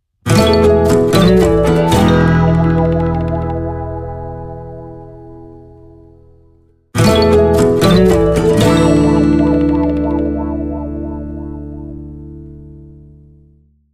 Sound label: JINGLE GUITAR